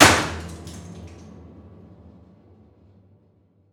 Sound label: Key Smash, Piano Destruction